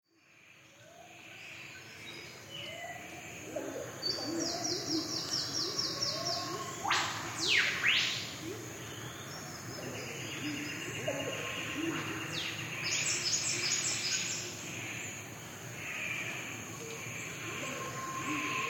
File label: Walk Rainforest